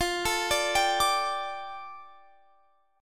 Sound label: F 6th Add 9th F Guitar Drop D